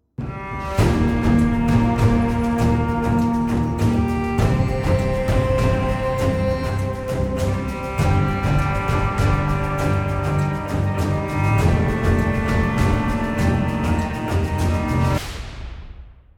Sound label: String; Assassin's Creed Unity